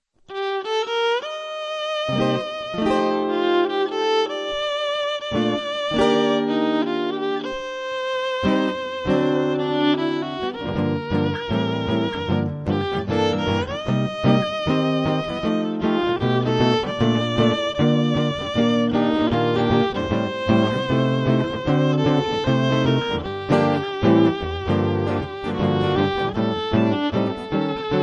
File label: violin guitar